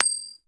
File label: coin toss; megundal